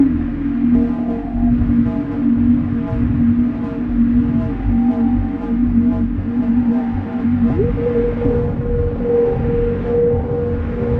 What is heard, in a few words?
Blubber 20bpm